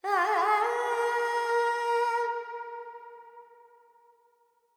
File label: Verb Dorian, Vocals, Carnatic Female, Alaps, 70 G